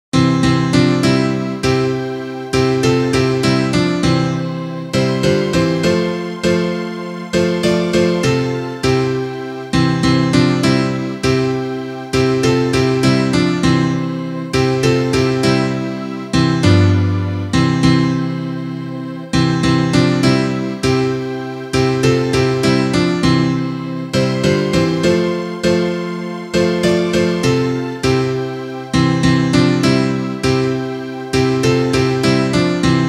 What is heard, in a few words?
instrumental